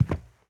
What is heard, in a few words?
Footsteps Sneaker Carpet Walk; Infernal Radiation